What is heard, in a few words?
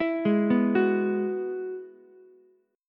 G sharp Minor 7th Sharp 5th, Ukulele; arpeggiated